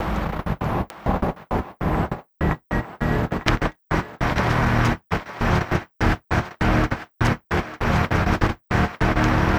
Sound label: Envelope Beat